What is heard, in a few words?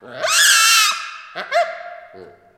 Baboon Longer Shriek